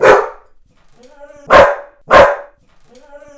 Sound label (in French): chien